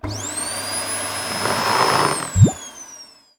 Vacuum Drag; Rabbids Coding

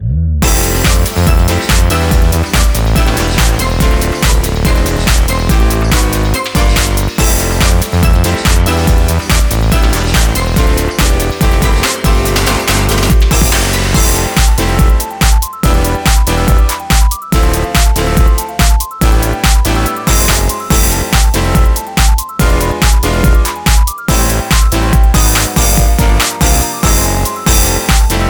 instrumental; Super Forest Beat vol10 2020

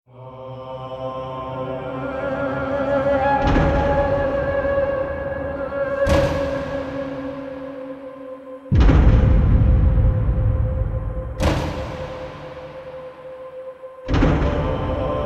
music loop; Anima ARPG; Ubers

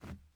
plastic jump; teardown; ps5 windows xbox series xs

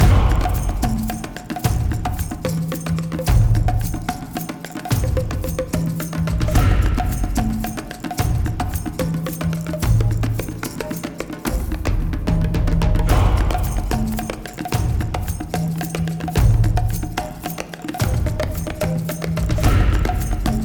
Garden Stem Test LOOP

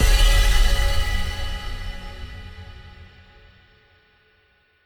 Rush Combo; Mixolumia

